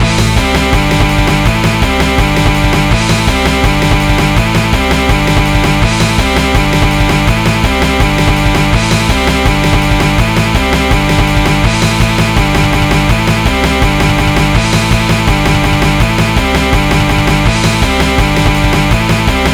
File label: midi